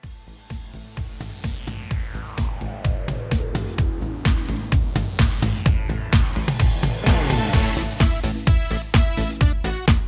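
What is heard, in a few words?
Instrumental with background vocals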